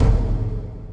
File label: bass drum